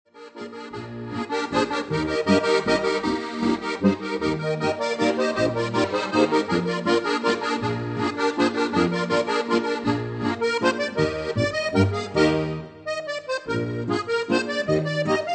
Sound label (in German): plattler; Harmonikastückl aus Bayern